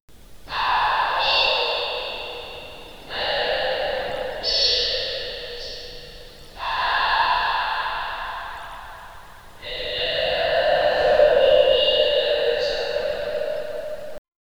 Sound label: mordovian spies lurkers i, lurking